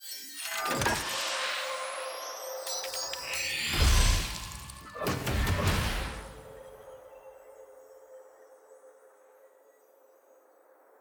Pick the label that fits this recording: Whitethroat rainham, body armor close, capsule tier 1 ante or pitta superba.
capsule tier 1 ante